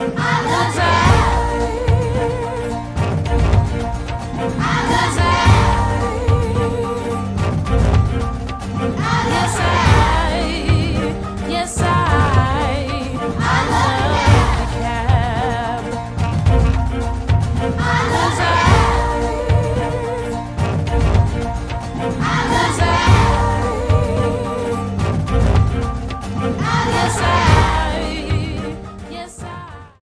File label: ringtone